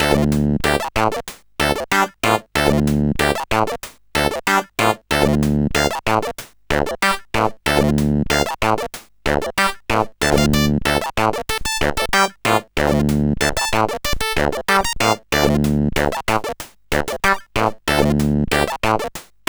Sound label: Funky, CSG8580R5